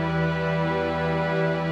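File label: Pad E minor, Orchestral; Sonic Foundry (Sony Creative Software); Orchestral VOL-2; Pads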